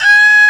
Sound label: HEAGUM G#4, KOREAN